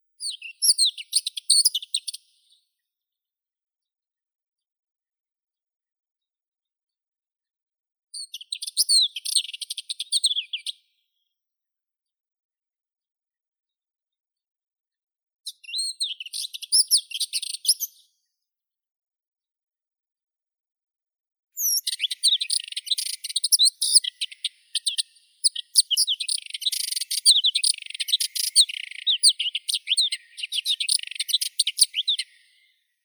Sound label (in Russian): zvuki prirodi slavka belousaya sylvia mystacea